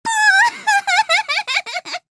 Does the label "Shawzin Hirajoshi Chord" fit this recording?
No